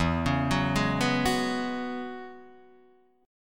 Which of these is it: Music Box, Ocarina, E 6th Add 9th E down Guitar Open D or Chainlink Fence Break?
E 6th Add 9th E down Guitar Open D